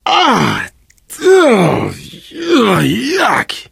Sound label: reaction disgusted, biker, voice; source engine; left 4 dead 2